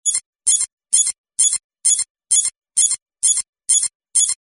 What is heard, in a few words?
alarm electronic chirps